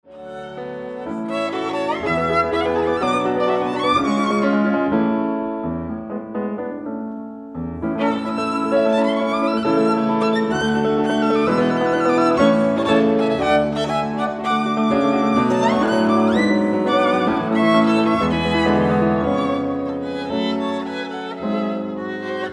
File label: highland ballad